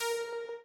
arp

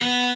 cello1

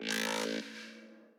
wobble

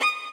solo

